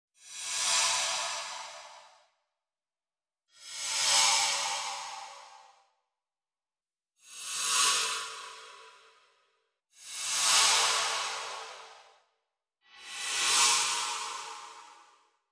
healing in game

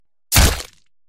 Headshot Rapid

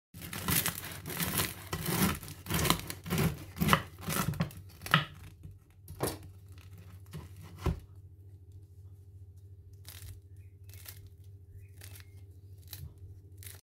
Cutting Bread

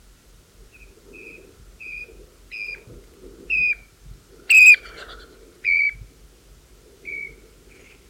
Rufous chested dotterel

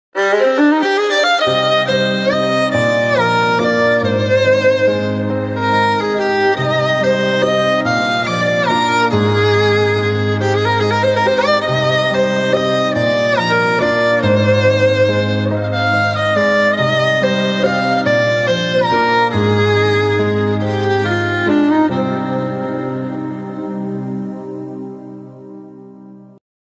Violin; ویولن نوازی میم مثل مادر

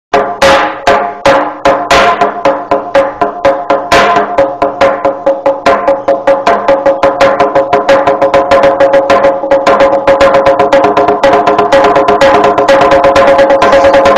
Semantron

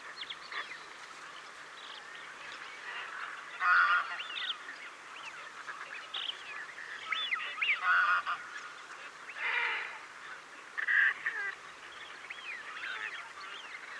Curlew sandpiper; پرنده تلیله بلوطی

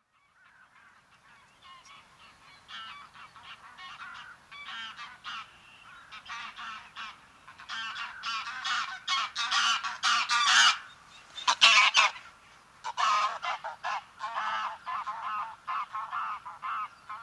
Anser anser; غاز وحشی خاکستری

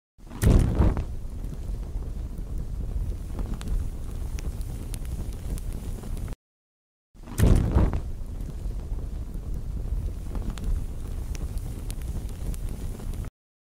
fire torch

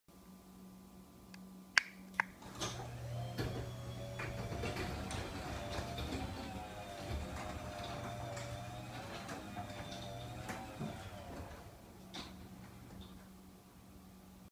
Parking door, باز شدن در پارکینگ

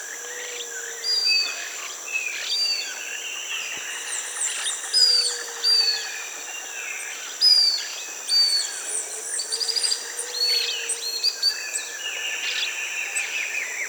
Pied puffbird

